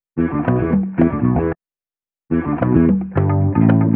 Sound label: guitar raunchy raunch, Loops, Phonky